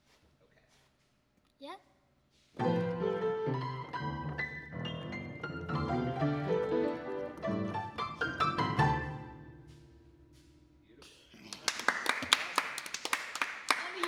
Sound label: Piano